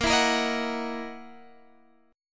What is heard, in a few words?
Gb 13th Bb, guitar